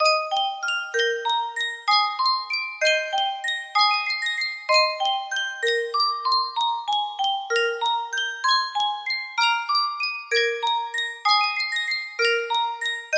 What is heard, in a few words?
Sankyo Music Box - music box melody